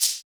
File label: Shaker; Equipped Music; Loungin House